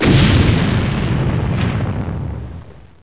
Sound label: EXPLOD; THEXDER